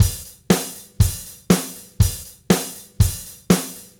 Gretsch groove 120 BPM, 1967 Gretsch Kit drums

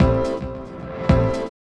Chords Tones; Equipped Music; Loungin House

